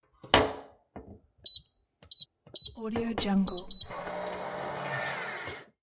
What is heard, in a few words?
doors safe electronic digital close